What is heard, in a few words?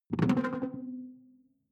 sci-fi alien bounce detuned; swooshes-swishes